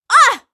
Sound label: Damage Female; gamerip; Car Simulator 2; android